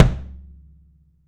FELT KICK; 3D Sound; 3D Drums

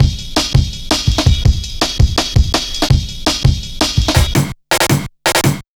Drum Loops, Jungle

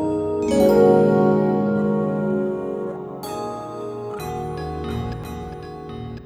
Funk Keys; Newdark; Sonic Foundry (Sony Creative Software); Synchro Funk

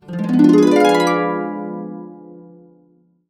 D dim7 up, harps; USB Soundscan vol.62; Complete Harps; AKAI

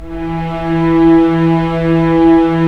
STR SC, Roland LA Composer; Roland - LA Composer vol. 4; Full Sec

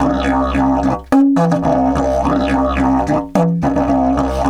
108, Didgeridoo

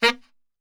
B 1 SAX SHOT, Brass Super Section; Best Service

